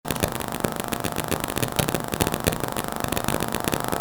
noise; 5050 valve preamp; flatterndes stoergeraeusch

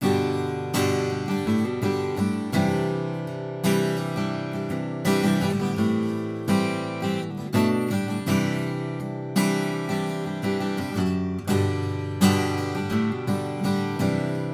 Schoeps CMC 64

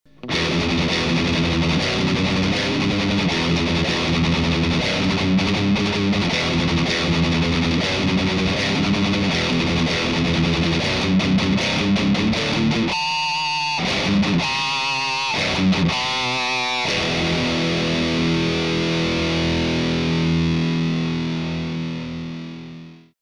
Horizon Rhythm, ESP Horizon, Metal Rhythm

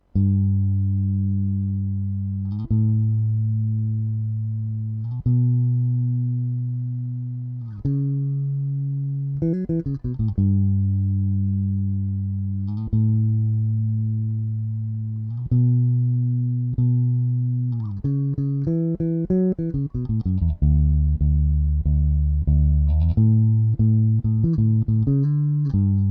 di bass mono

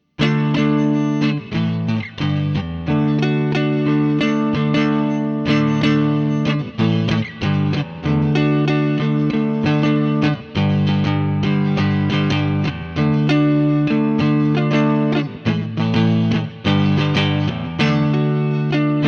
clean riff, Gitarre, Harley Benton TE 70; black paisley

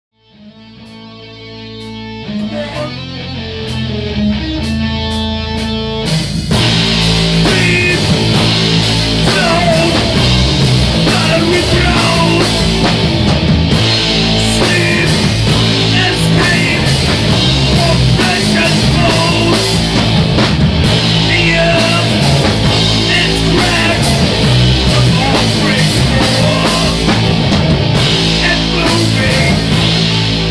smooth jazz

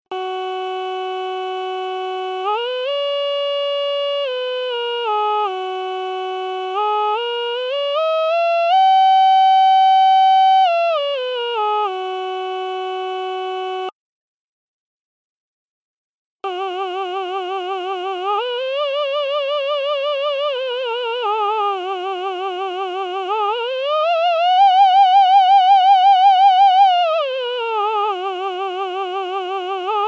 formant vs vibrato female

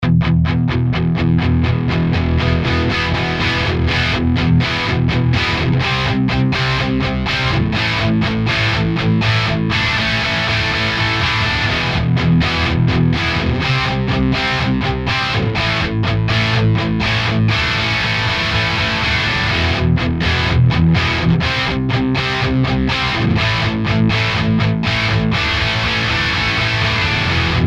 crunch normal, Ceriatone Yeti 50

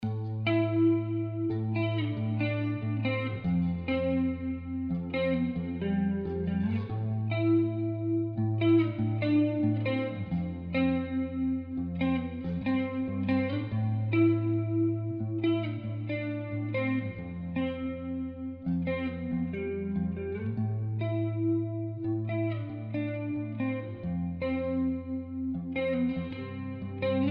clean neck, Gitarre; kiesel solo 6h

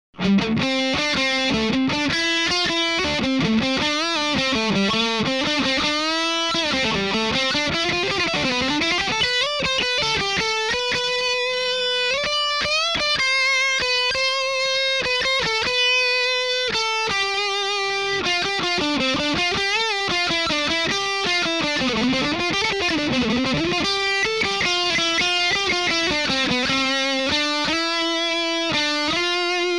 M1000 lead Impro, ESP Horizon LTD M1000

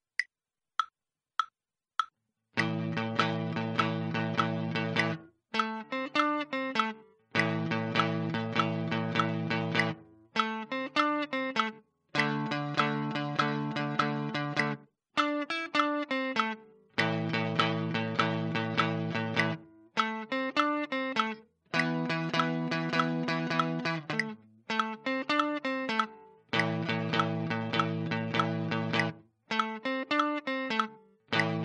blues solo 6 e metronim 100bpm

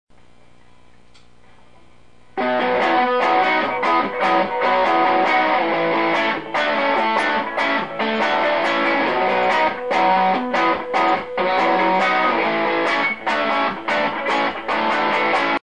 soft lead bridge, gitarre fender stratocaster; Fender Yngwie Malmsteen Stratocaster

